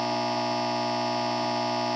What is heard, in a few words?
power transformer loop; ambient; machines; Counter-Strike Global Offensive